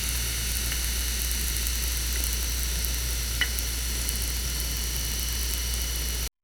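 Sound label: cozy wooden kitchen ambience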